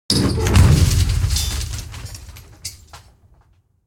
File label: dry rusty metal impact with collapse; sonniss gdc